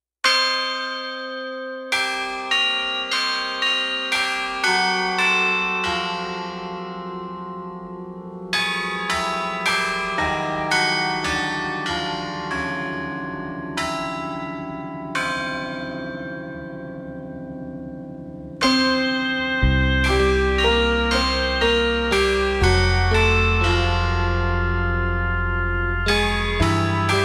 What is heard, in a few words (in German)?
Intro Playback